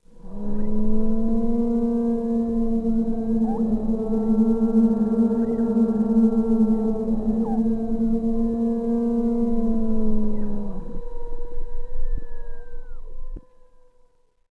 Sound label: Scary Wind; Ambient; Sid Meier's Civilization 4 Complete; Beyond the Sword